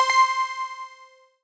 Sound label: chimed; Of Cabbages And Kings; pygame